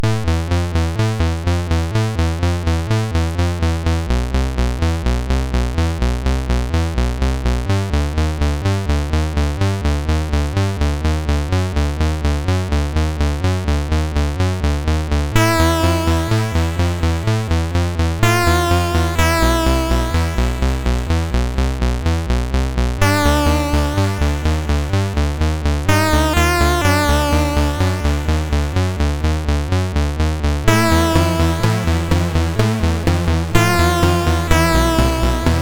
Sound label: Energy, MOS6581R2